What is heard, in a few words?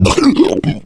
male boomer disrupt vomit, zombie; Left 4 Fortress